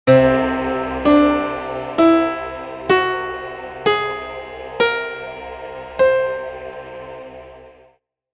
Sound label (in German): Ganztonleiter